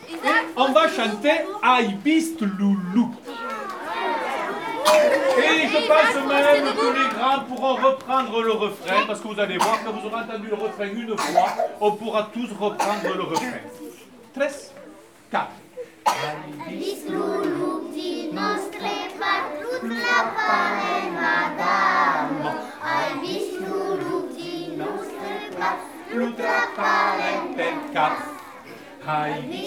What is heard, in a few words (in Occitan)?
Las cançons